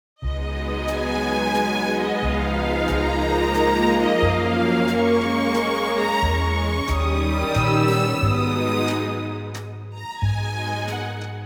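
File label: G orchestra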